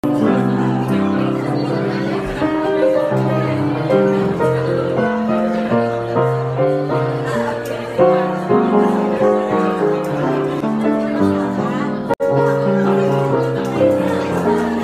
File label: At jazz bar gig